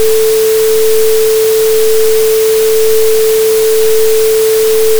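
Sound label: Dithered Triangle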